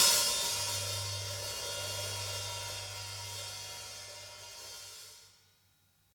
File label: Hi Hat Open, acoustic kit